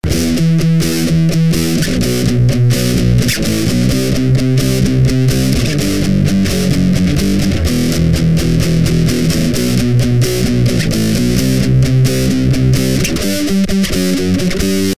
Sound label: Trash Voise